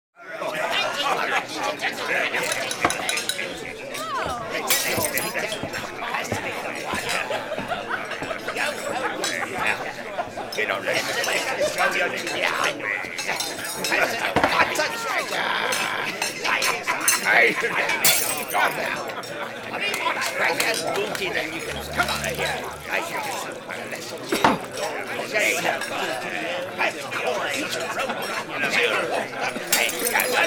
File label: inn ambient